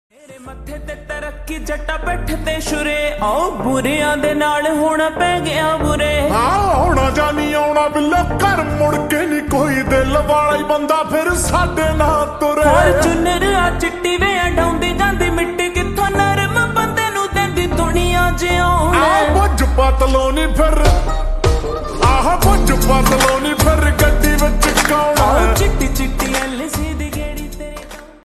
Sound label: Slowed Reverb Ringtone